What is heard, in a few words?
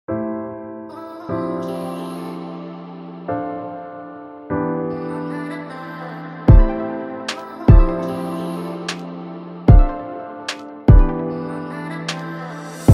Golden Era Flow Lofi Chill Beat